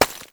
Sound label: ice break